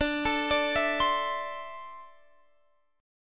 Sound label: D 7th Suspended 2nd; arpeggiated; x,17,19,19,17,20; chord